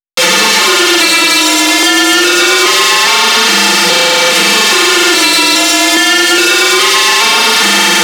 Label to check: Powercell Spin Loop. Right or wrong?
Wrong